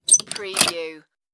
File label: spring lock